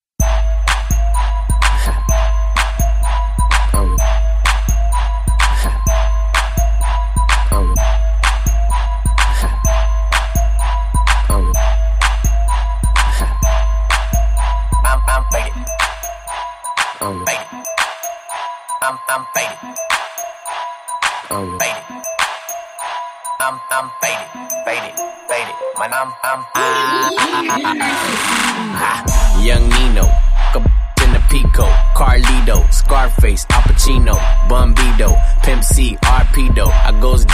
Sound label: Transition Alt Clean, 127 95